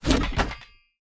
construction repair

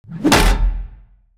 morgenstern hit; player

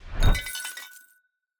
Box Flask Launch; TANK; 3Souls

